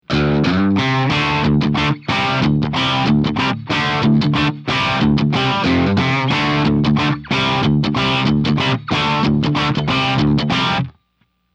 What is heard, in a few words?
Crunch